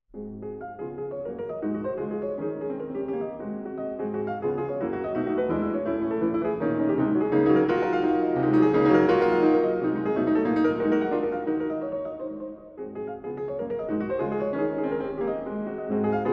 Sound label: Etude in C sharp major; scriabin